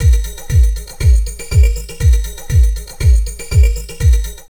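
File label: fluid, drum loops crazy processed, 120 bpm; USB Soundscan vol.07; AKAI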